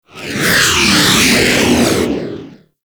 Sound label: Fighter Ship Close Short Fly By, Space Fighter Ship Engine; Warframe; RailJack; Enemy Ships; Corpus